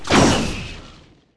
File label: Micro Photon Torp